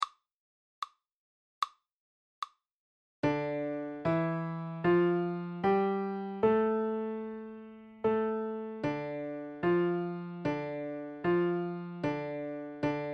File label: minor 3; minor third; intervals minor 3rd exercise